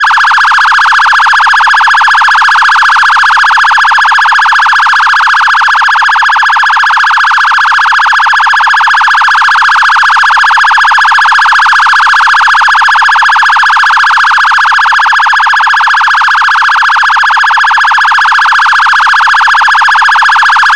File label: electronic phaser siren